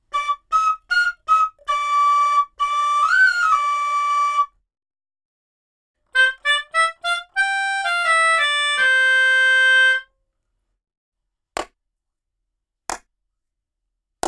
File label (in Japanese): アイソレーション・ブース：Studio 2, 非常にタイトな初期反射のみ; ドラムやアンプを隔離するためのブース。非常にタイトな初期反射のみが得られ、音に「存在感」と「太さ」を加えます。